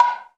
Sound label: Bongolo, Latin Percussion; Sonic Foundry (Sony Creative Software); Joe Vitale Latin Percussion